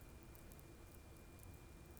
pulses, BALAENOPTERA; MONACO 2022-07

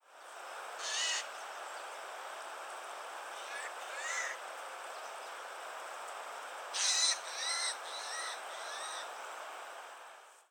Garrulus glandarius; SETE

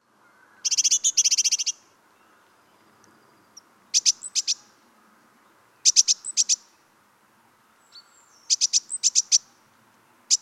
Parus major; SETE

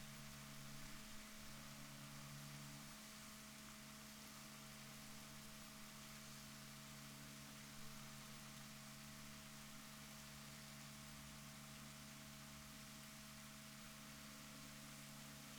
rorquals negatif, Balaenoptera Physalus Chile

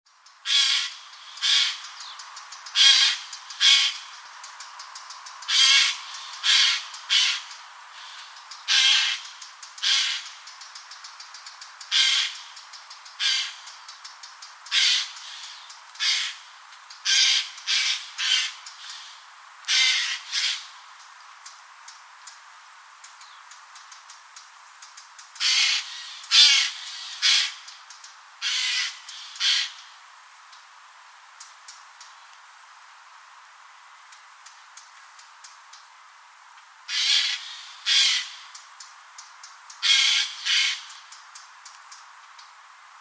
Garrulus glandarius; SETE

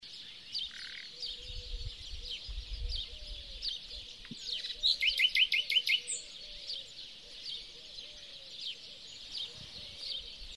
Luscinia megarhynchos; SETE

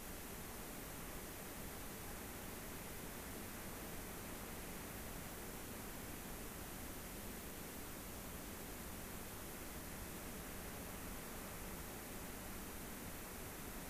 rayol farm; SEAMED

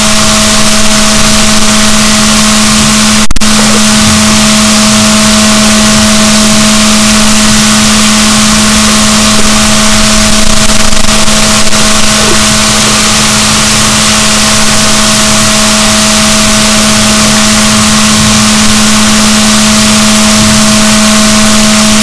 rorquals negatif, boat

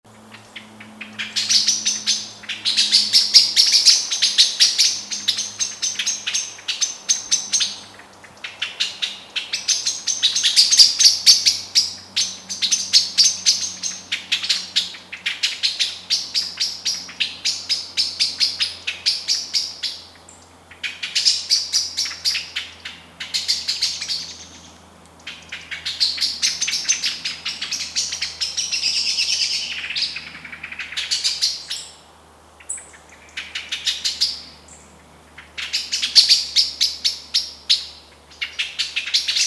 Turdus merula; SETE

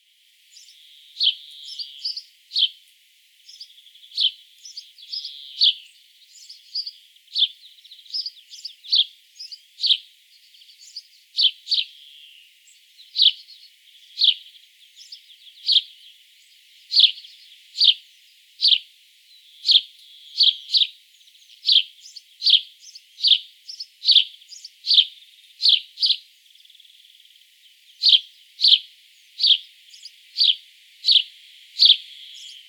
Passer domesticus; SETE

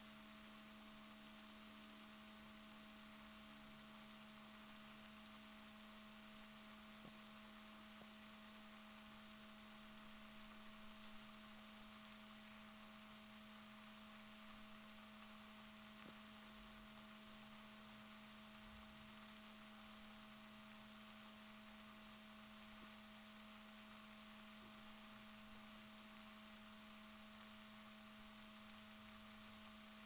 rorquals positif, Balaenoptera Physalus Chile

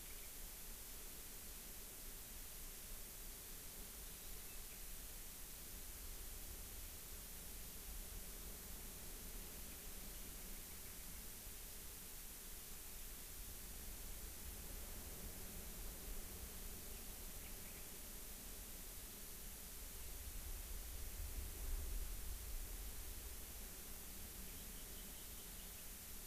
rayol farm; SEAMED

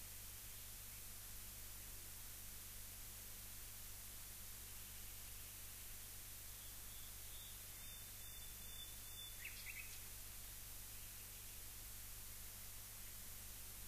rayol farm; SEAMED

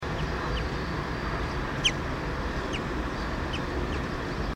Fringilla coelebs; SETE

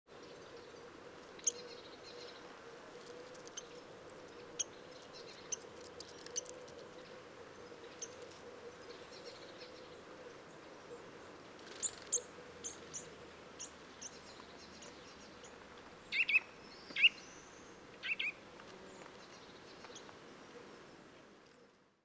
Sitta europaea; SETE

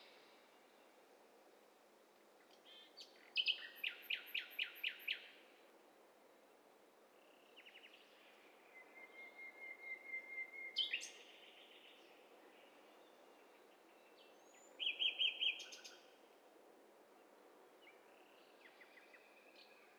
STEREO BIRD COCKTAIL PARTY

